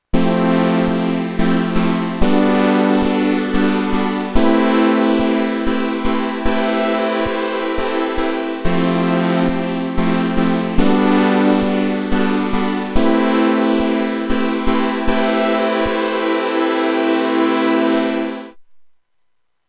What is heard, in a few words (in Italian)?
Suono generato con X LAB; Sonicware; Liven XFM